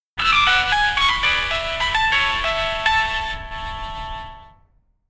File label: Broken speaker